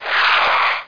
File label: fast rocket